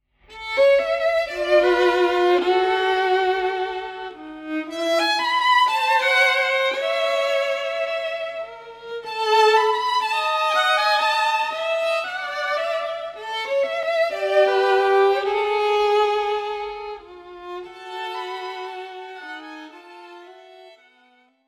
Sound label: Marching Song